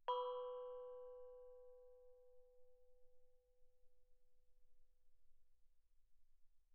thai gong B4 mf; thaigongs

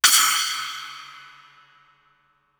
splash stick normal ff stereo, Suspended Cymbals; Percussion

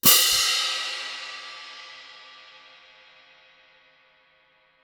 orch crash medheavy ff, Crash Cymbals; Percussion

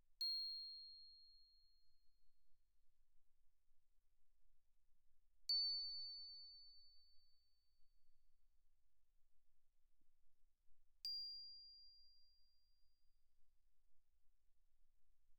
bells, brass, C8 E8; Percussion

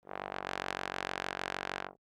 Bass Trombone ff Gb1; MIS Pitches - 2014; Brass

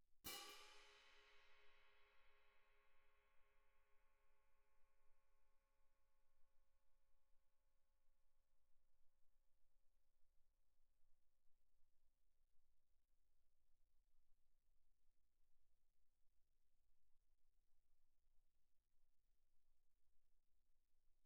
17 orch crash, med heavy, pp, crash cymbals; Percussion

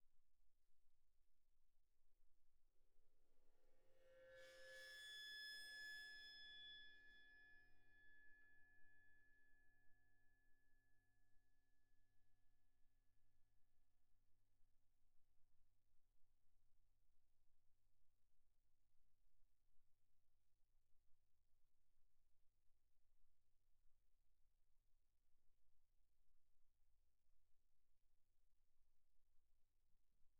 20 wind gong, bow; Percussion; gongs & tamtams

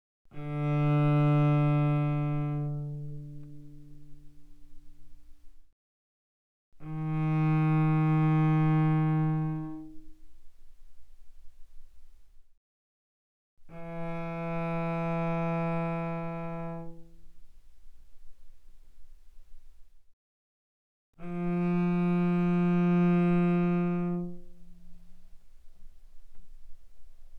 Cello arco mf sul D D3 Db4; mono; Strings

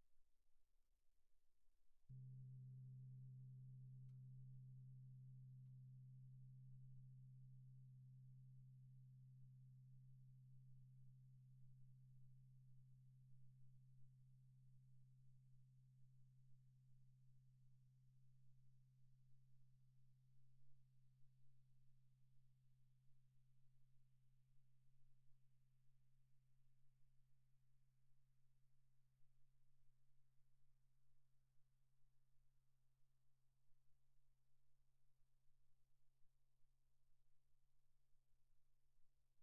22 tamtam, pp; Percussion; gongs & tamtams

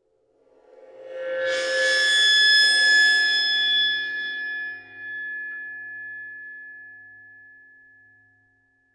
20 windgong bow stereo, Tamtams; MIS Pitches - 2014

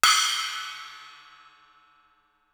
splash stick bell ff stereo, Suspended Cymbals; Percussion

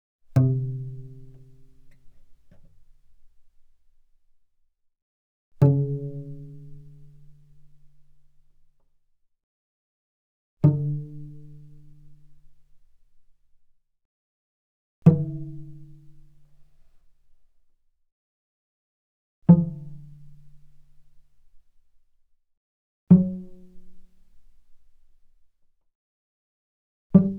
Cello pizz ff sul C C3 C4; mono; Strings

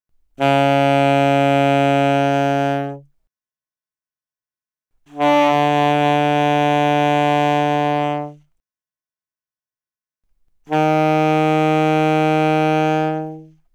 Alto Sax No Vib ff Db3 B3, alto saxophone

